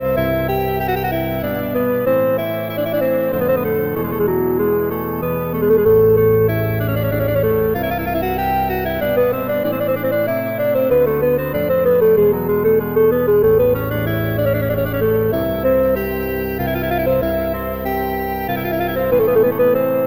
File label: quasar lead